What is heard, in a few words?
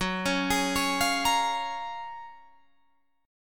Gb Major 9th, down, Guitar, Drop D